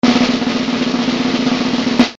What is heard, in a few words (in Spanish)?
REDOBLE